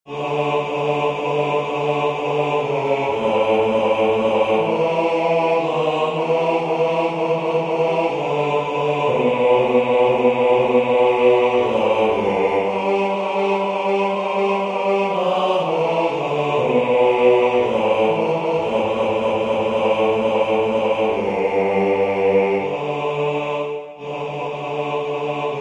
Voici le Corps et le Sang du Seigneur basse

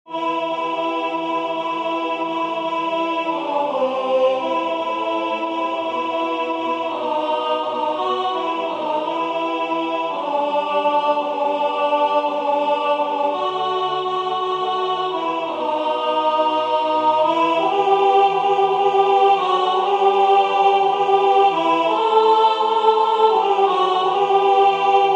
Vous êtes dans mon âme alto

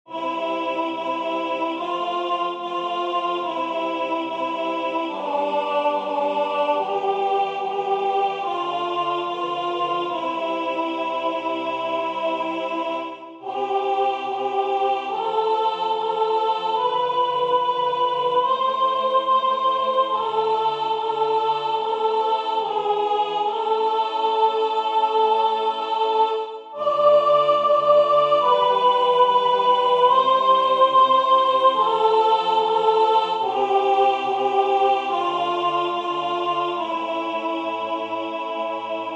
Priere des freres moraves soprane

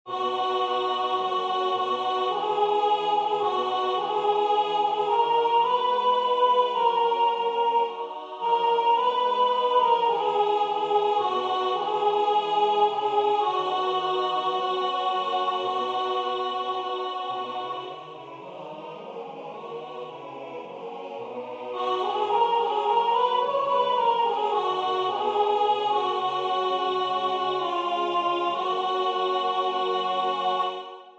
soprane; Cantate Domino; Hassler; Hans Leo Hassler

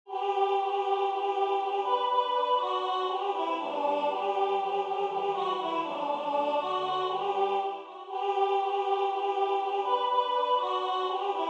alto; Les anges dans nos campagnes; Gevaert

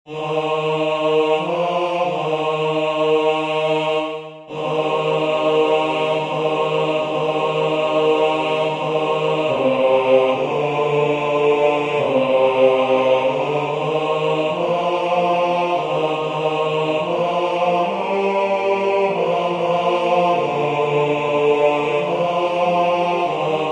baryton; Christus factus est; De la rue

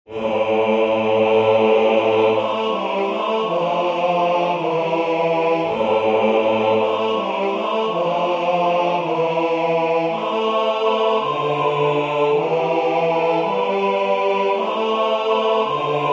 basse; En toi Seigneur mon esperance

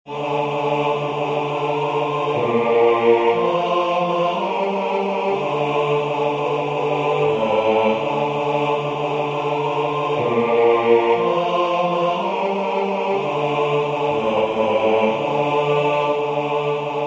basse; Benis le Seigneur o mon ame

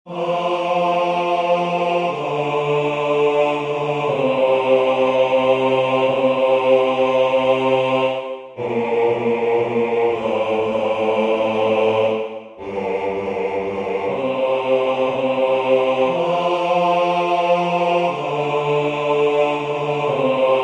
basse; Ave Maria priez pour nous

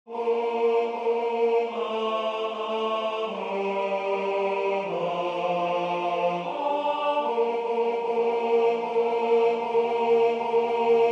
O vrai Corps de Jesus tenor; Du Sejour

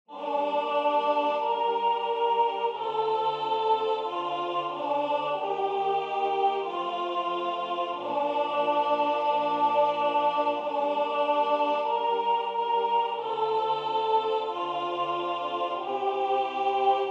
soprane; Totus tuus

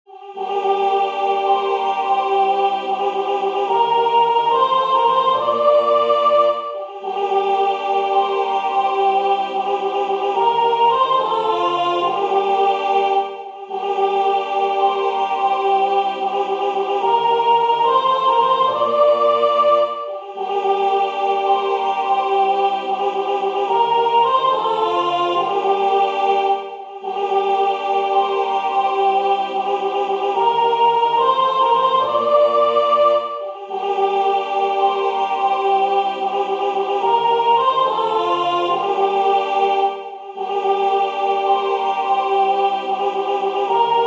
Jai vu des fleuves deau vive soprane

